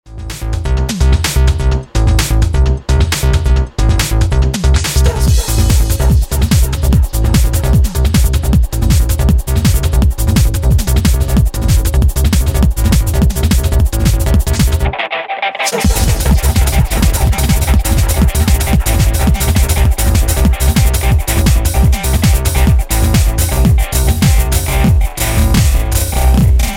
Pitchen mit Key Adjust

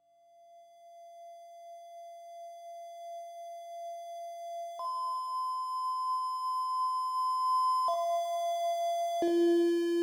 komplexe Modulationen

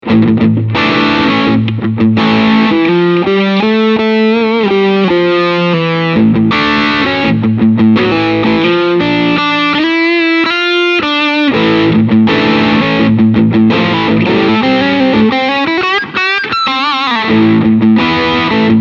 Crunchsound

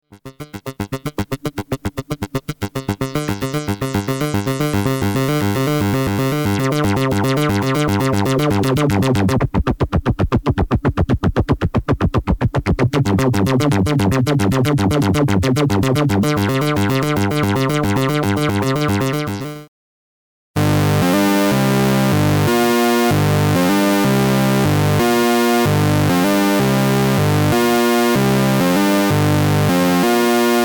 Solus manuelle Sequenz

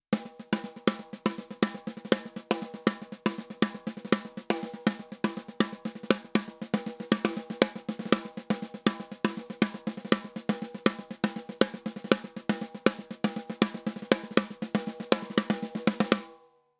API Audio 2500 Snare Drum 100 Prozent Kompression

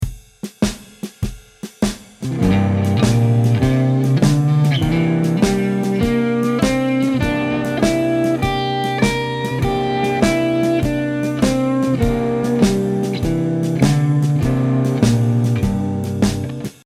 Moll 6 Pent

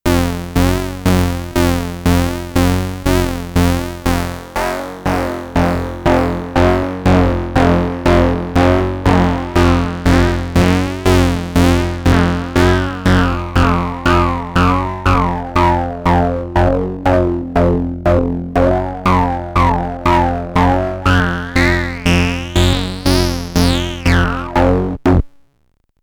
Anamono X mini OSC Type1 moduliert erst pur dann mit digi BPF; Gotharman

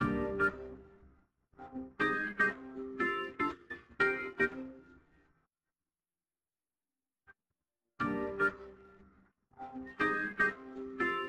nur Klavier; Steinberg SpectraLayers Pro 10 Demo

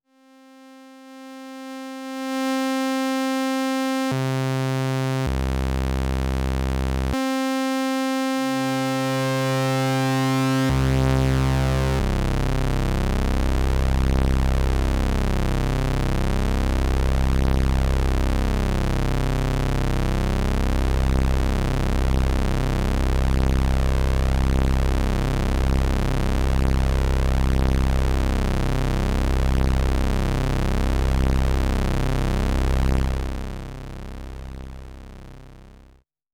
zwei Oszillatoren stimmen

